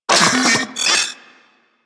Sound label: Vocal Alerted; Warframe; Corpus; Mini Moa